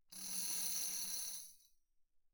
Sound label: tambourine thumb roll mf; Percussion